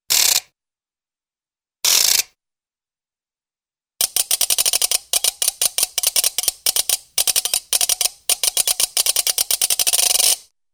Ratchet Clicks Metallic Ratchet-like Clicks, cartoon; Hollywood Edge; Cartoon Trax